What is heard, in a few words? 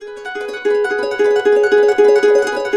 AB, Harps; Complete Harps; USB Soundscan vol.62; AKAI